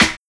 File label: Snare Hip Hop; EasternHopV1; pitchglitch